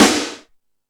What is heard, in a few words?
Hip House, Drum Machines E-MU Pro-Cussion